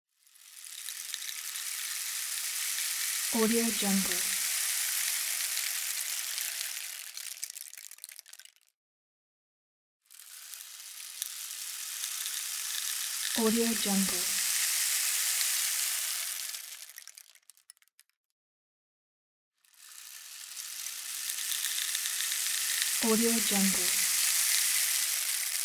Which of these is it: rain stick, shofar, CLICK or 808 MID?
rain stick